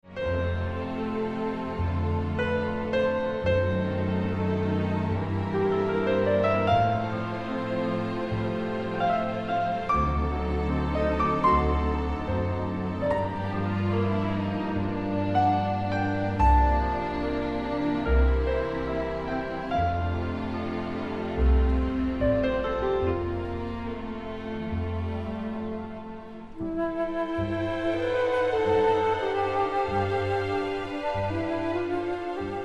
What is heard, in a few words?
Melody In F; Rubenstein